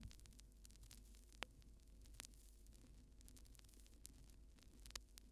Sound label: Vinyl Crackles